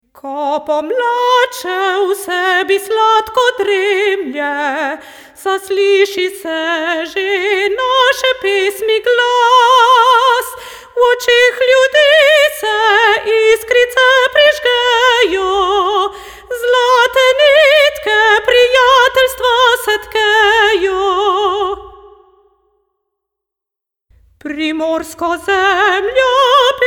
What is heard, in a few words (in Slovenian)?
SOPRAN; primorska poje; skupna pesem